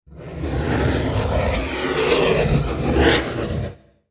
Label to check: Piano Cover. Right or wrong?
Wrong